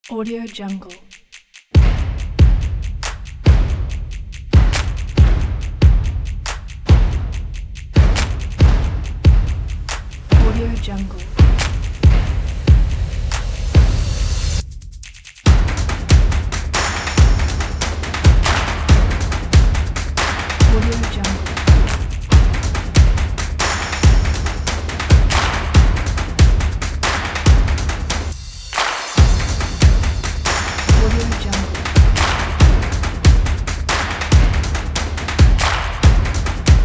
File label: stomp